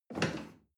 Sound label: chair wood take medium